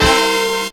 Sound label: HORNHIT; E-Lab; X Static Goldmine 2; akai